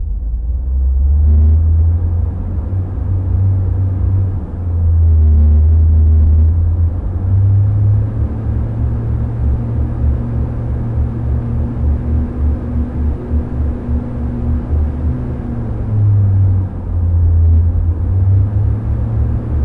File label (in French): bruit home trainer; ocivelo